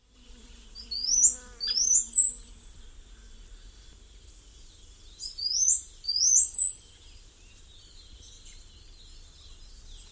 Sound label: Plain Wren, S. America